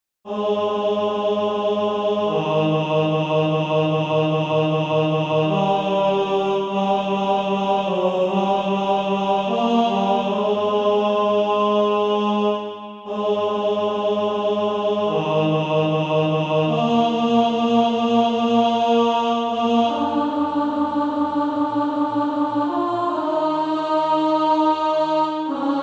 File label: ten, SATB